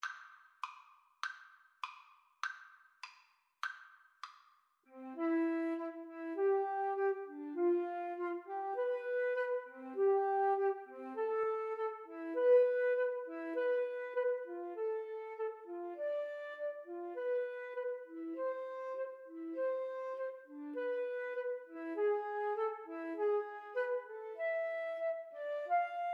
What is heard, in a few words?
Flute duet